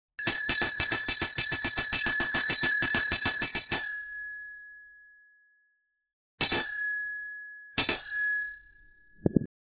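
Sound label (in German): fahrradklingel; bicycle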